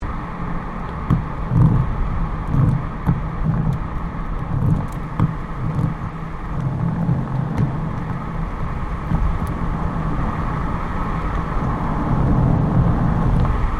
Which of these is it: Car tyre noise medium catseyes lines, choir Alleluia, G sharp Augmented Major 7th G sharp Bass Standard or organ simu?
Car tyre noise medium catseyes lines